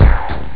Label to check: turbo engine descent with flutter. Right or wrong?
Wrong